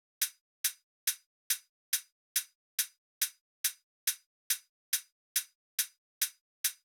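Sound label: drum Loop 140BPM overhead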